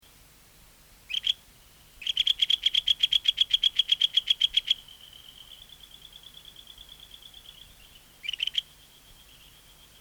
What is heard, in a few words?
Buergeria Japonica Is Calling